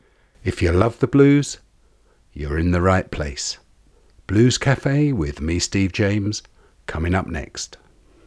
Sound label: Dry